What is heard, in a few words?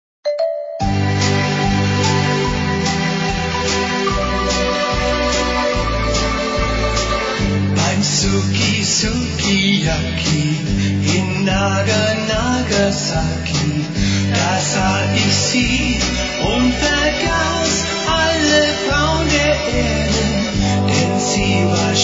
In German E Japanese